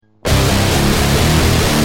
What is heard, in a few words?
Guitar Rage, Industrial